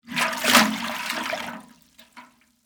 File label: Water Slosh Bucket, Water, Slosh, In, Metal, Bucket, Large; Splashes; Objects; BlastWave